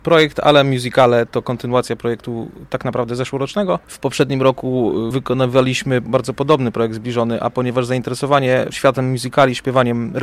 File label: Musicale; 22 utwory musicalowe można było usłyszeć na scenie Kolneńskiego Ośrodka Kultury i Sportu.; Kolno; Radio Nadzieja